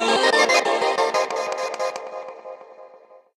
stuttered, notifications; Sony; Xperia Z3

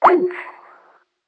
remote hangup; Netgear SPH200W Skype WiFi Phone

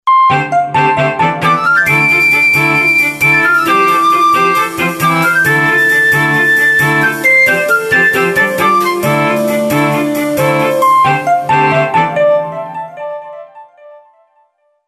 Flute on a string; Sony Ericsson; P1i

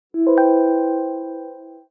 Alert; Lumia 640 China

